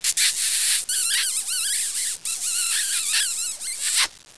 smooch; XMEN2

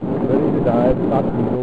stop diesels; U-Boat 1.5.3

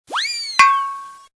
toc; MOBICLIC 83; CPLUSCLAIR

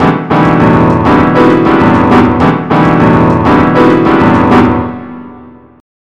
BOSSA; BUSKER L

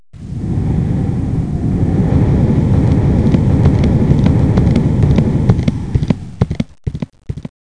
bumper; louvre

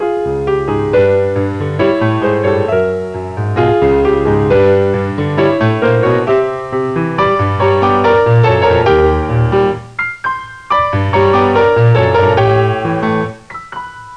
Tune in loop; Sixties; Rockument

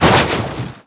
droid hit; Star Wars DroidWorks

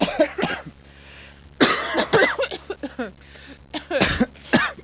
cough, people illness

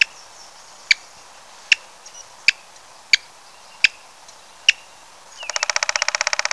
Black-backed Woodpecker; Woodpeckers; CD-ROM Today - The Disc (Issue 5)(November 1994); Bird Songs

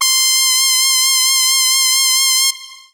lead, synths

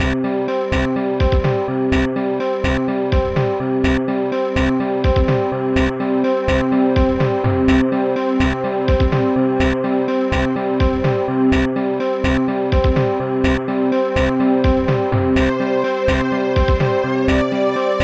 hiscore; Protracker Module; Protracker and family; Protracker M.K.

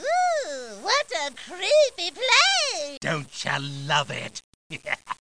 OOhh; Bible Fun Pack; Education Publishing Concepts

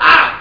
Ouch!; dithell in space

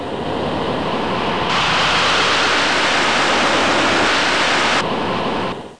jet

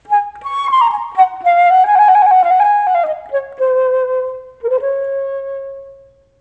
bar flute; Song Book

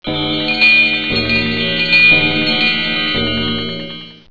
Bells; T.J. Finds a Friend

